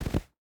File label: footstep barefoot snow jump land; Rust